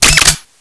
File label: plasma mag in